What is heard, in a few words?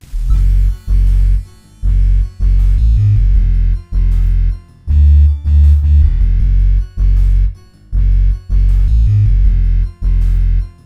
excessive bass